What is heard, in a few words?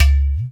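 percussion udu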